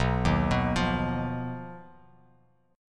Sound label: B Major Flat 5th B Bass Standard; BMb5; arpeggiated